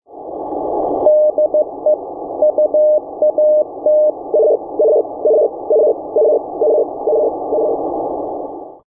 sitor

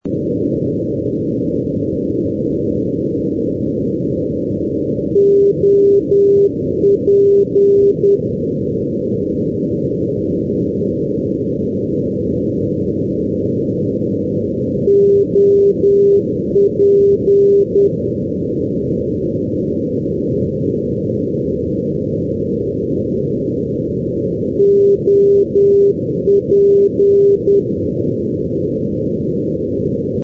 ndb dublin

